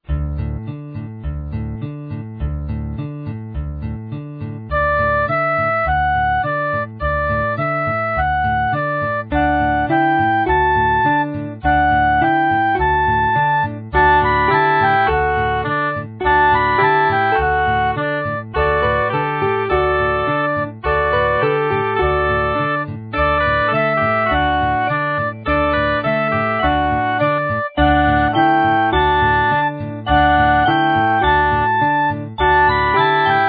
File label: music; mother